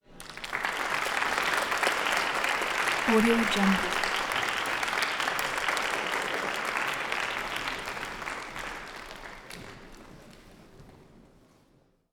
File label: humans hand clap clapping; دست زدن; human sounds